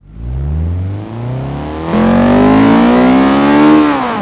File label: first, vehicles